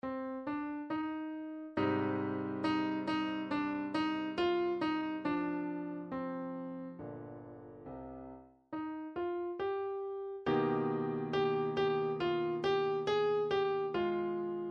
bartok ten easy piano pieces hungarian folksong, midi